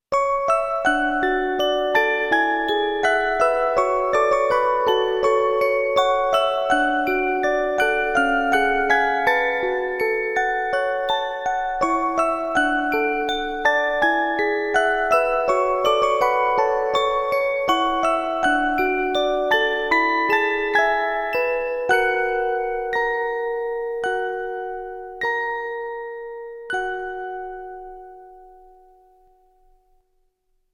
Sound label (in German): Orgel